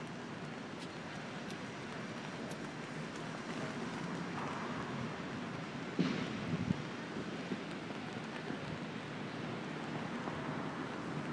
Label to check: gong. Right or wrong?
Wrong